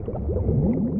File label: Water run; Water Baridon; Seer; GamigoNA